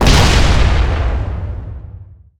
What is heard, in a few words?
Enemy Jet Active Hit